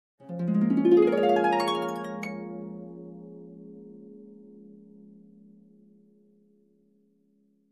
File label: Harp Glissando Up